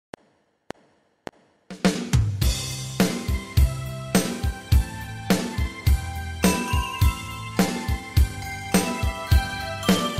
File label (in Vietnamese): beat, nhac beat